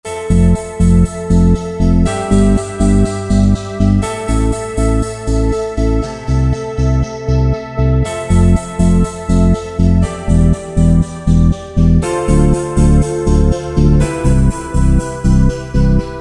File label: Roland JV-2080; Temple of JV